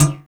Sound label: PERC, Drum & Bass, 170 BPM